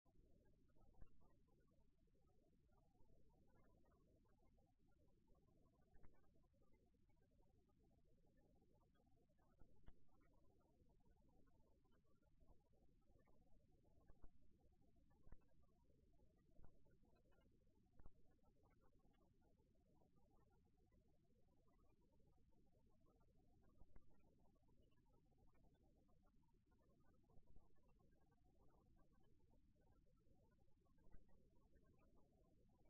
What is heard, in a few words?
riverside; Kyoto U; Ashiu